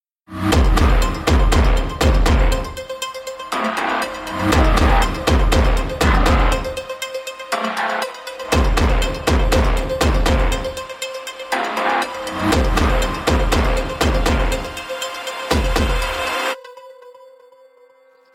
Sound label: bgm level; Cocos Cyberpunk